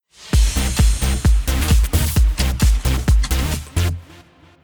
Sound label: boombox; Miami Beach; Wazdan